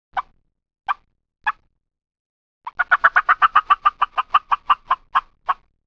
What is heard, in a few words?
Turkey Fly Down Cackle